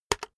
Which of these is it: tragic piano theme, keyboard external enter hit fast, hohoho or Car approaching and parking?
keyboard external enter hit fast